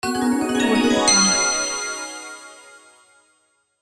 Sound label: fantasy spell